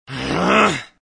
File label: Growl; Sonic.EXE; Tails Nightmare 3 demo